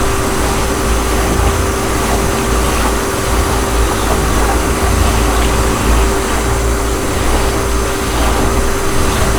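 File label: machine hum splash loop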